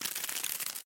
Scorpion

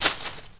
Ball Sounds; pangya fantasy golf

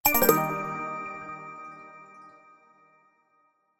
Kids Game Mode Close; Kahoot

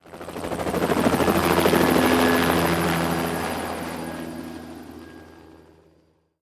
Takeoff; Pocket Planes; android

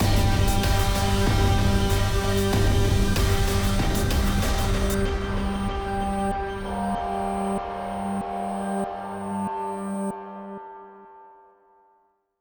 Outro 95bpm; battlefield hardline; ps3 ps4 windows xbox 360 xbox one

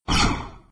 Mech Projectile; Doodle Jump 2; android

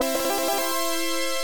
Battle Won; madou monogatari i; megadrive

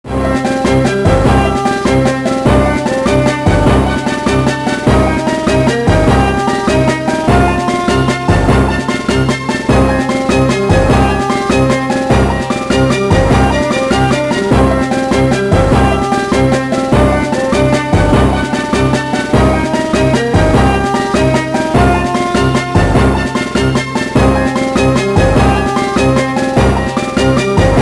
Victory (Unused); X2 Wolverine's Revenge; GBA

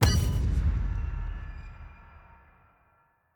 Horse Shoe Pickup; The Town

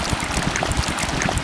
Slimethrower

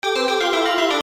ice; End of Aspiration; android

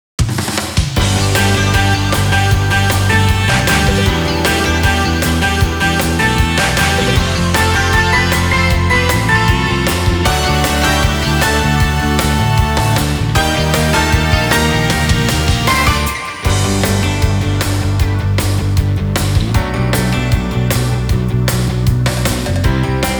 karaoke bgm; yakuza ryu ga gotoku kiwami 2 pc gamerip

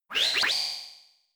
Air Slash; attack moves; Pokemon; Gen 4; DPPL HG SS

